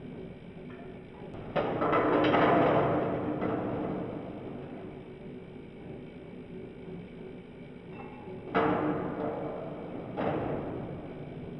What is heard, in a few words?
Pipe Machine